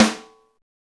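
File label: Snare, Roland; Club-50 - Foundations; Naturals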